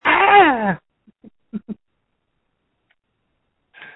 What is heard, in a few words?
Scream